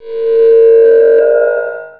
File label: Sound Magic